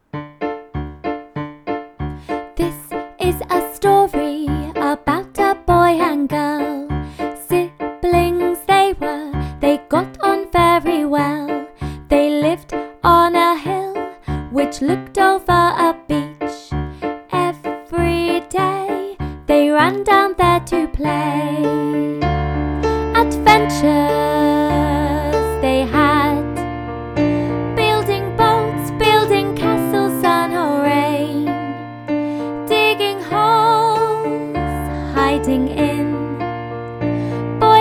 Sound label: with vocals; ks1 musical storytelling